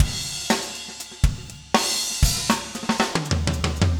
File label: funk 120 bpm, loops